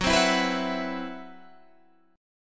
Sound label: C Major 7th Flat 5th F sharp